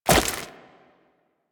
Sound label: Wall Jump Goo; Footsteps; Warframe; Tenno; Wall Run